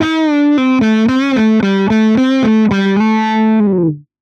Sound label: wah dist